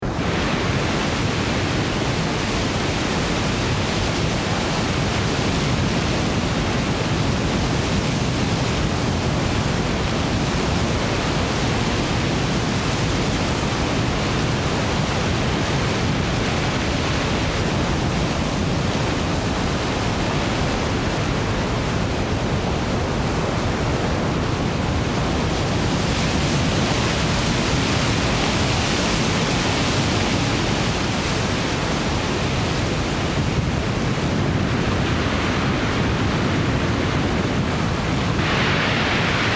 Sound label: japan landslide